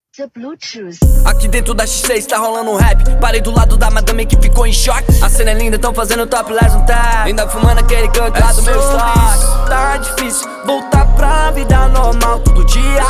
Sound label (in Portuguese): rap trap